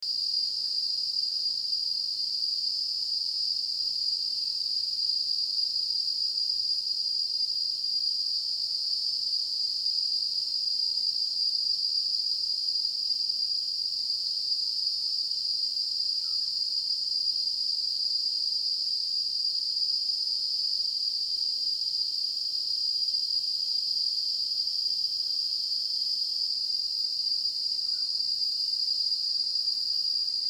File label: Chremistica umbrosa